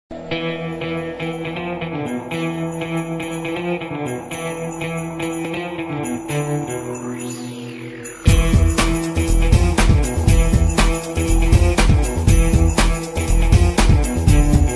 theme ringtone bgm; don

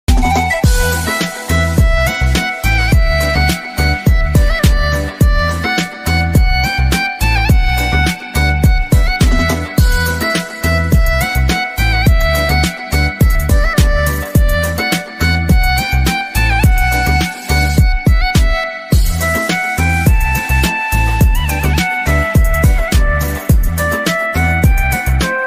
love bgm ringtone tamil flute

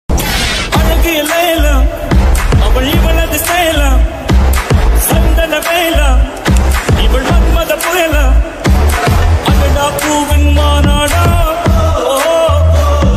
song bgm ringtones tamil bgm ringtone

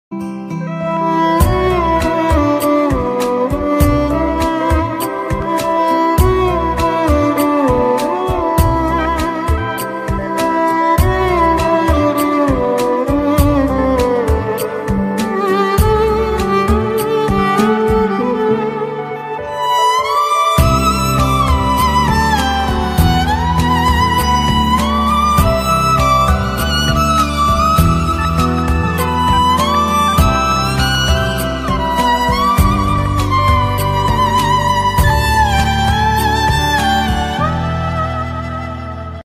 only music ringtone

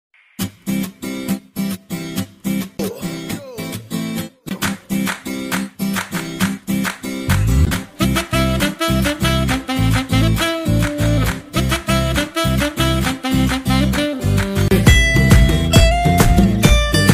song instrumental ringtone

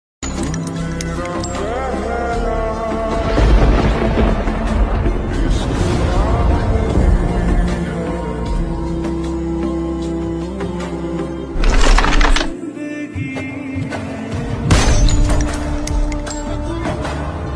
montage hindi song; bgmi; pubg montage; gaming

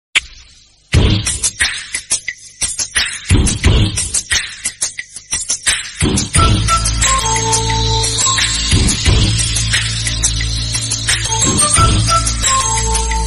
love feel bgm ringtone

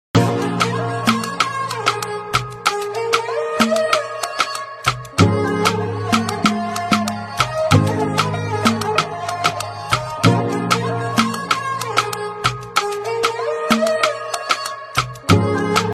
instrumental ringtone love

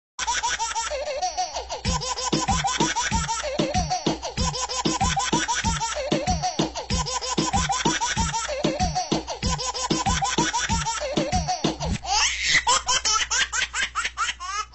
laugh baby smile ringtone

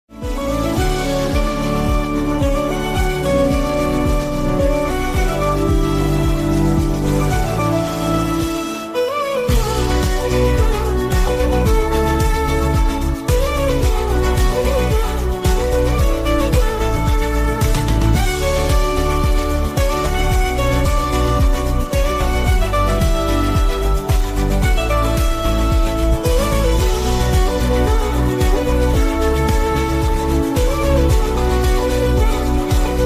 violin extended; amaran

